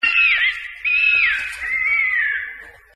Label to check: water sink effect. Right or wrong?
Wrong